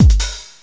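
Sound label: drum loop; Descent II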